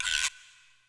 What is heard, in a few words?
guiro long